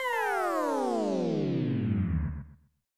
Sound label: Throw Bomb; Kablooey; SNES